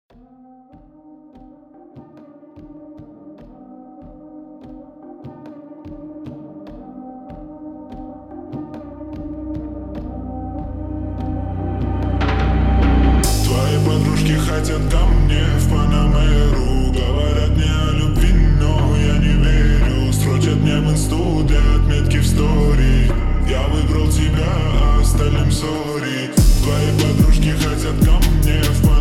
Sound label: brazilian funk